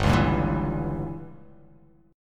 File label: G Diminished 7th